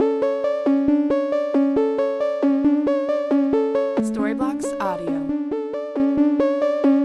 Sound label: Ambient House Arp 136 BPM A